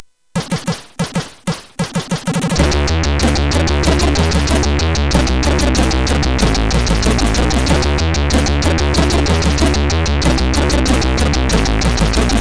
Cool Intro Music, CSG8580R5; hvsc

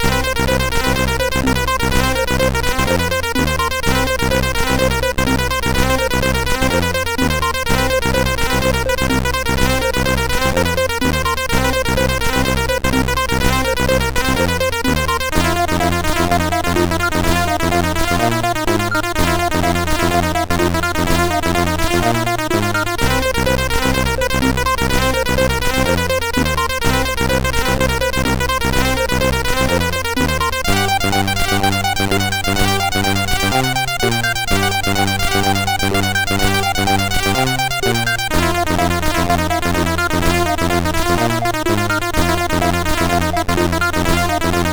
Blues, CSG8580R5

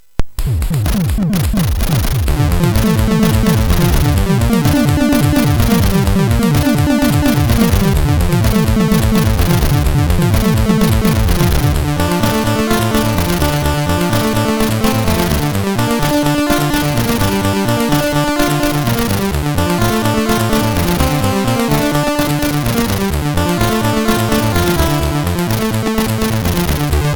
Knock Remix sid MOS6581R4

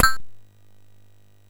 SFX, MOS6581; Narco Police

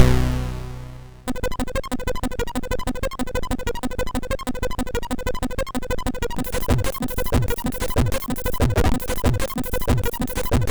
Intro music, MOS6581R4

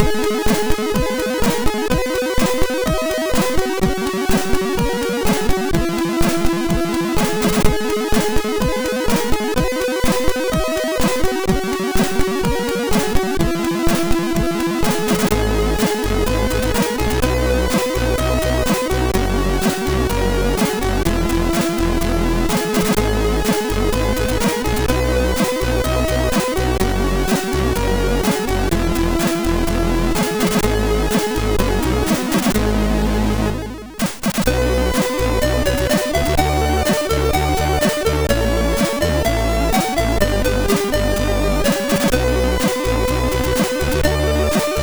No Limits tune, MOS6581R3; sid

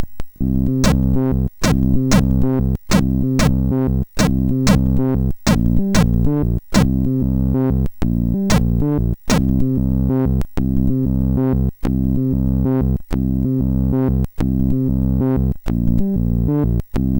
Test Music, sid MOS6581R4

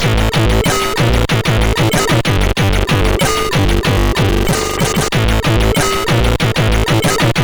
Test, CSG8580; hvsc

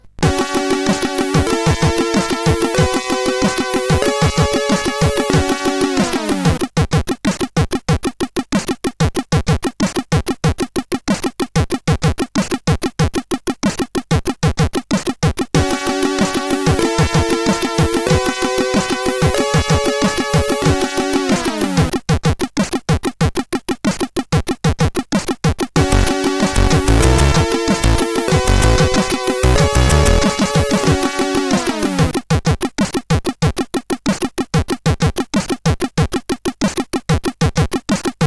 Digital Zakx tune A, sid CSG8580R5

